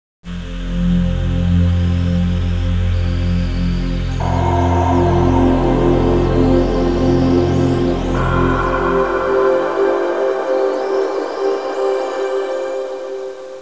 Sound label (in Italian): musica tecno; techno